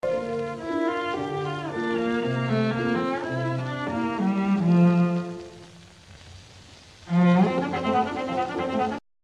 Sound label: arpeggione